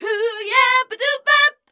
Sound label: MELODIC A, Voice